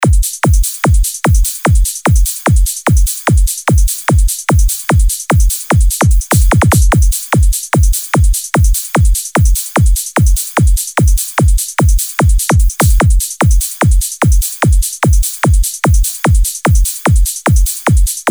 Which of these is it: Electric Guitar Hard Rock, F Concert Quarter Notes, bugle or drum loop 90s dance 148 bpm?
drum loop 90s dance 148 bpm